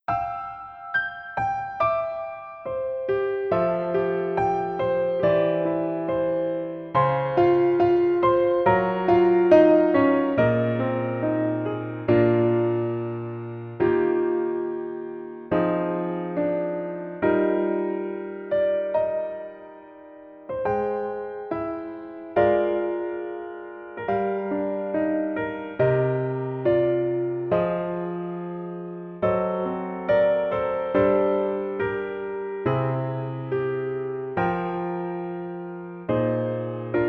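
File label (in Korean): Piano version 여자키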